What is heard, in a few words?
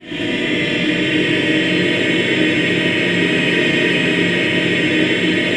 clusters, voices